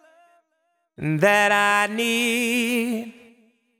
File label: deep house male, 125, A, vocals